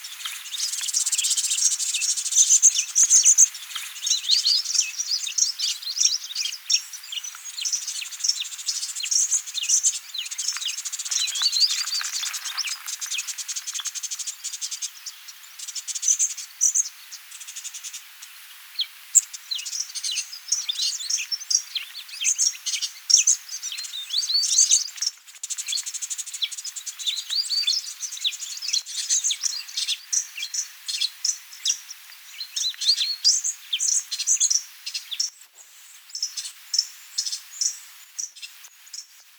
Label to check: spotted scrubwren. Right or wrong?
Right